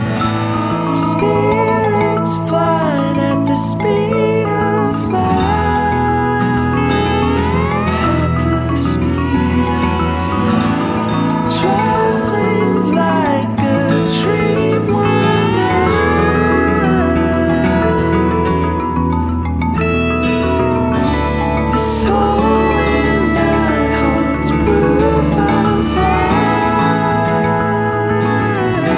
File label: Is Calling Pop